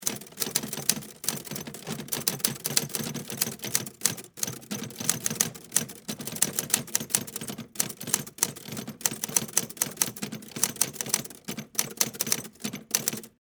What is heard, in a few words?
Typewriter Type, Technology, Typewriter, Smith, Corona, Type; Typing Sound Effects; BlastWave